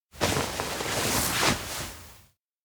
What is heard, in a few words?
uniform move aside floor; anemoi